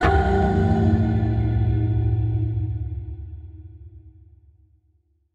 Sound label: Jack Box Scare